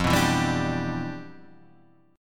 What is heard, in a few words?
G 6th, F, chord; G6/F strummed